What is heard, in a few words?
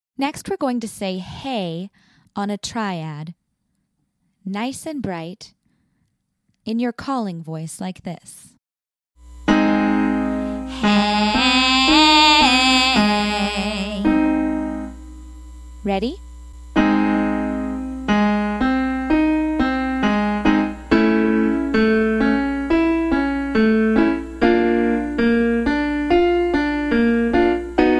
Hey Triad